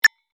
Computer beeps digital menu single beep; sci-fi

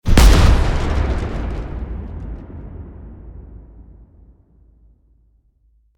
Cannon fire single shot; artillery; gfxsounds

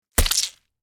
Cartoon splat

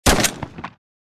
Benelli M4 single shot

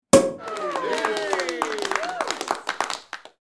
Champagne bottle pop and applause

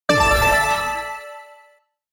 Casino slot machine bonus win

